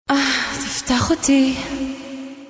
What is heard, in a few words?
For Message